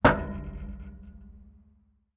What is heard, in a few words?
metal impact gate hard vibrate